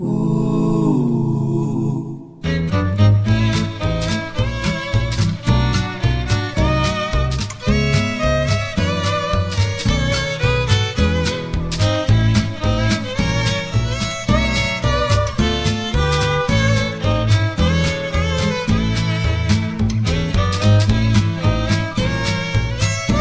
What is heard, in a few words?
Music Cowboy; Nancy Drew Danger on Deception Island